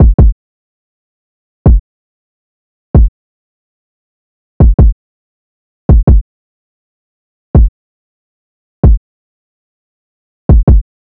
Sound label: Drum Loop 163 BPM Kick, Trap; Blizzard; Cymatics; ANALOG EVOLUTION Beta